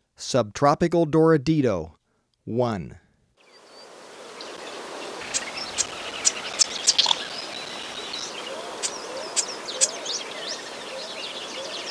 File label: Subtropical Doradito; S. America